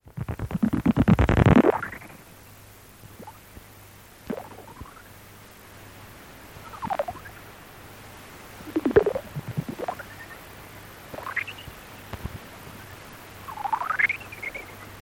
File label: R ferrumequinum, Correze; juillet 1991